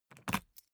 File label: briefcase plastic latch lock key pull out ringing; industrie wirtschaft; bueroarbeit